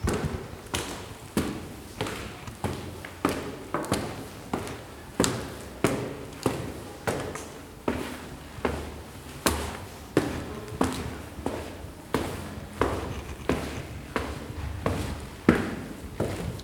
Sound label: Walking On The Stairs Inside A Building